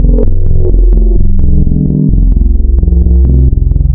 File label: Spectral, wavetables; Architecture 32